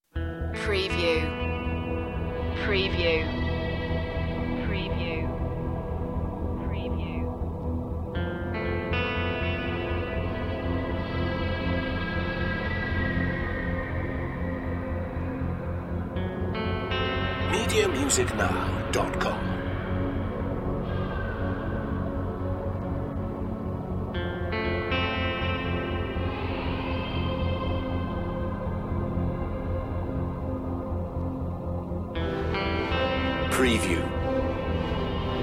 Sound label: spy tension